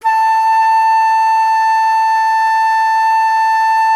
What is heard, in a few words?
FLT, WOODWINDS; Roland - LA Composer vol. 1